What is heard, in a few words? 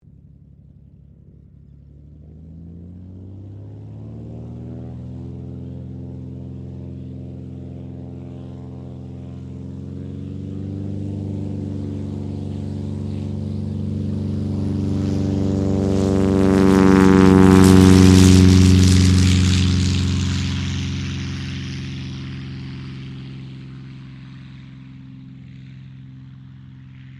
AIRCRAFT CT4A TAKEOFF, military trainer aircraft; Airplanes